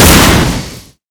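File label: radio explode; Call of Duty Modern Warfare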